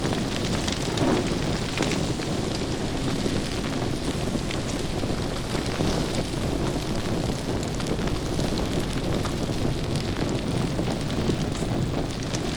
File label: idle fire; LoL; brand